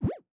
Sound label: Cartoon Wobble